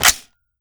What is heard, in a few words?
sr25 boltcatchslap